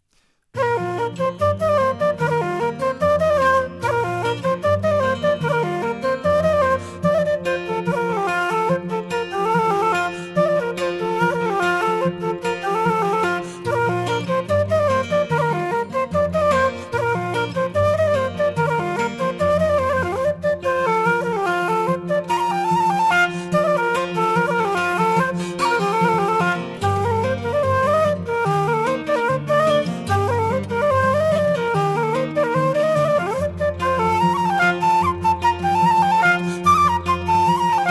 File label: Gavotte Bal; Bro Dreger